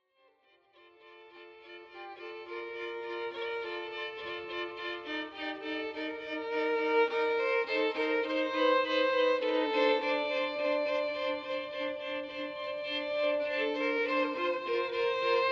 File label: Two Violins